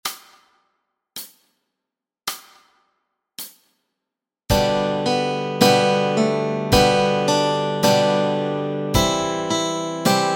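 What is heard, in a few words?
melody and chords, Easy Guitar and Ukulele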